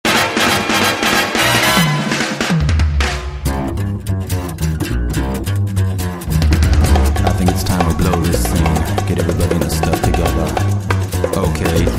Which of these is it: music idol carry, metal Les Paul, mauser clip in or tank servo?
music idol carry